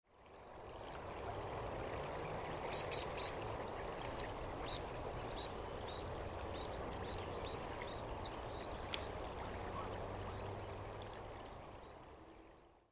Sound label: water pipit